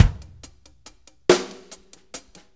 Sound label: 6-8 Loop, Full Kit Loops